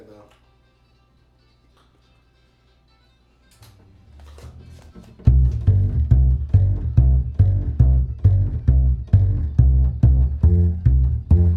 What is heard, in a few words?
bass